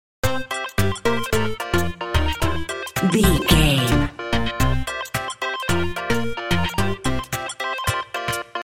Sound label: happy video game level loop